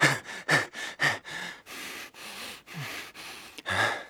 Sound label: male out of breath; Zomboid 41.78.16; Project Zomboid